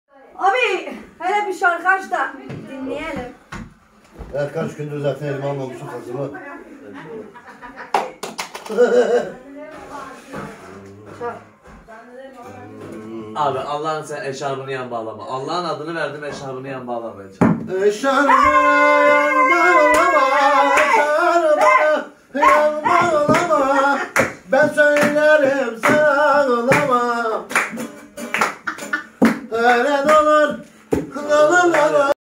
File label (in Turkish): saz calan